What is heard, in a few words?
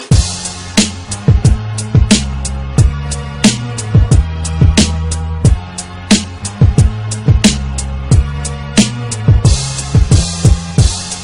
black beat, hip hop